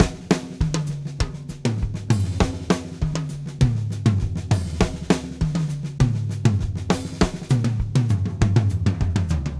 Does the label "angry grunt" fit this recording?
No